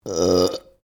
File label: Burp female medium, Human Burping